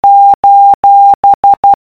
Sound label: Morse Code Colon